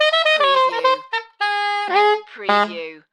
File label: Sax Riff Funny